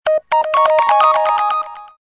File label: Zoom Up